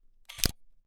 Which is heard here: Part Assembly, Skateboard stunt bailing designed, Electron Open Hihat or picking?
Part Assembly